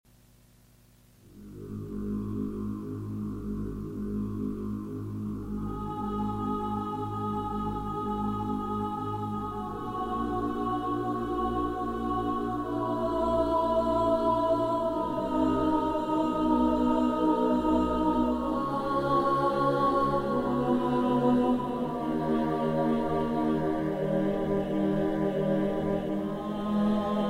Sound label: spooky choral